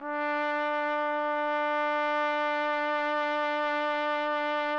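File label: TPT, trumpet; Roland - LA Composer vol. 3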